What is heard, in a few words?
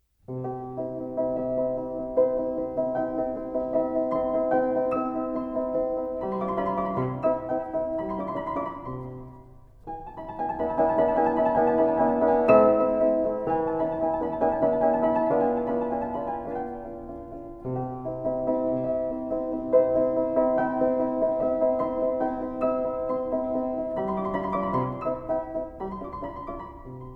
Piano Exercise in B-Flat Major